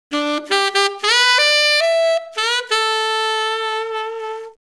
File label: sax